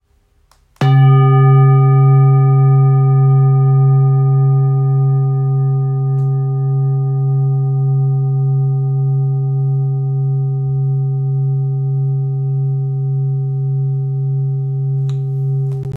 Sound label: Dorje bowl mallet, Sound Bowl; Dorje design 26cm